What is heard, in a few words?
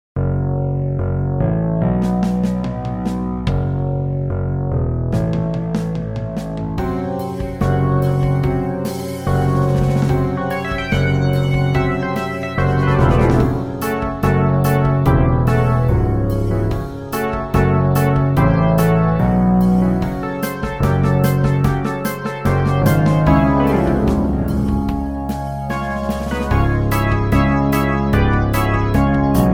Hammond B3 Dark Gritty; Master Hammond